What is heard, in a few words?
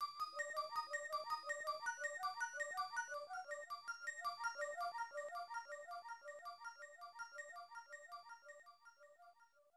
Logo; Street Fighter Alpha; Zero; CP System II; arcade